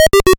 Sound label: 8 Bit Uh Oh Sound